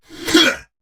Attack Effort; Warframe; Dax; Dax Knight

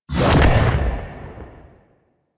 Edge Lift; Warframe; Pacifist

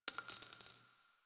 Target Practice Shell Casing; Quincy; Warframe; Quests; Nineteen Ninety Nine

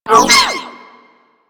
Corpus Energy Projectile By

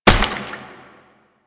Proto Infested Brachiolyst Step Water E; Enemies; Steps; Warframe

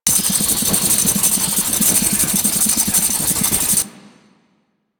Glaive Loop; Enemies; Warframe; Infested; ZealoidPrelate

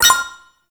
Menu Hover; MotorcycleRacing; Moto Race

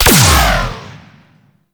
Cluster Shot Explosion; Enemies; Abilities; Warframe; Teralyst; Bigalyst

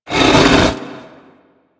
Rock Elemental Attack Vocal; Warframe; Brawler

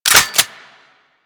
Reload, Weapons; Strun

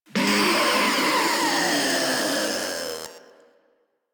Proto Infested Ancient Death, Enemies Vocals

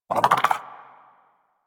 Armadillo Vocal Idle; Characters; Warframe; Hunting Critters

